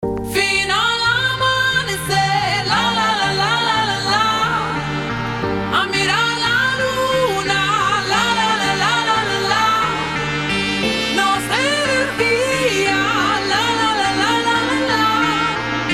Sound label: montagem slowed